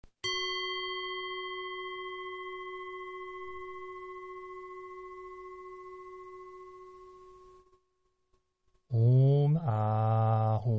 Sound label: Om ah hung